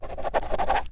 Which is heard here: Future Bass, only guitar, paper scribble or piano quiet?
paper scribble